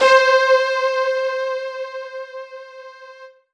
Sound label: Roland PN-D10-01 Marcato Strings C5